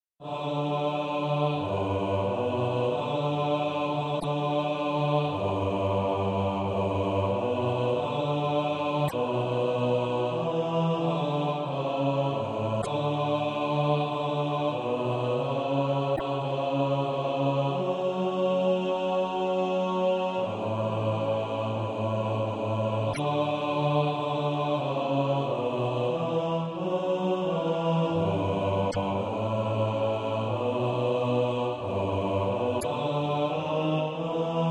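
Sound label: bass, Practise Music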